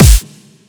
snare

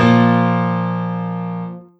Three Bb2 F Bb3; osu

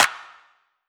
Clap

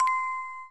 orgel

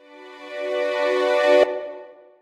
Strings rev

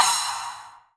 ride long low

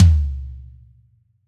drum hitfinish

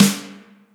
electronic; osu

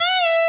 Bend full Release

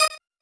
normal hit normal

